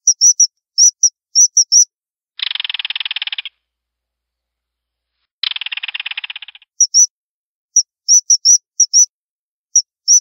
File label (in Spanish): Martin Pescador sonido